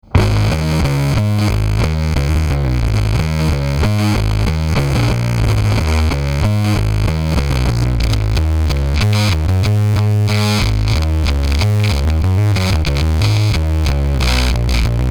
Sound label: Bass War Bass Fuzz 3 o'clock 50 50 Tone Sweeping; Death By Audio; pedales efectos fuzz